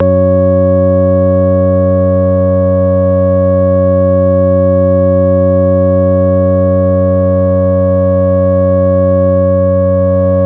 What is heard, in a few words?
Pad F#1, Prophet; Worra's Prophet ProphOrg; Oldies